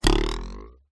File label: cartoon twang bounce ruler pitch rise